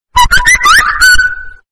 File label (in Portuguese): Som do Zap Zap estourado